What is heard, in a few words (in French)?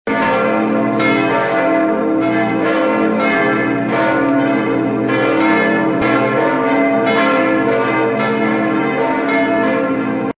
cloches de mariage